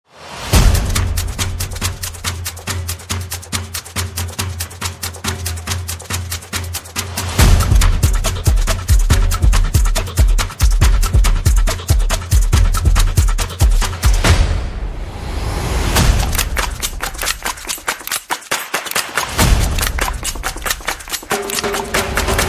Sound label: Snap Clap Out